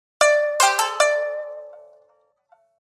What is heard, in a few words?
strings